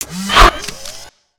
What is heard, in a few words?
Robot Attack Melee; creatures mechanical; Lego